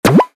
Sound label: shot water; starbites